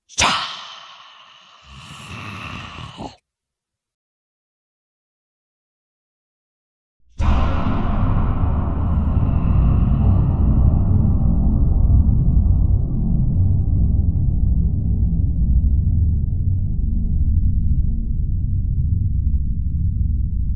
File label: plate rev